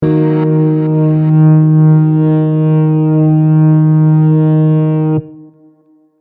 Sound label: transition note